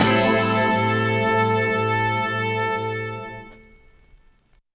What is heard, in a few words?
Stab Brass Wah Soul; One Shots; Ableton Live 12 Lite; Core Library